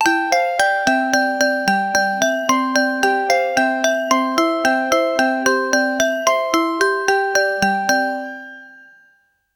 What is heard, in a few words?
create a vintage celebrat, ascending melody